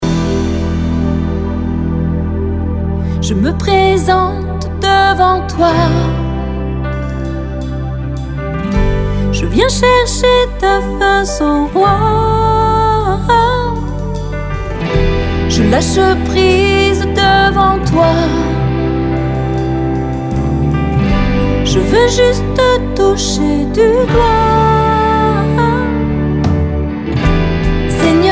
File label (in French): TE TOUCHER DU DOIGT